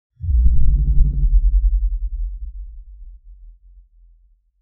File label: a cinematic psionic energ; telekinesis